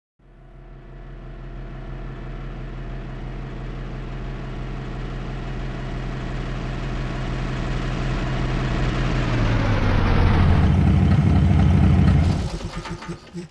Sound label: thunder; Mafie 1